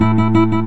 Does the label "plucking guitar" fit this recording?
Yes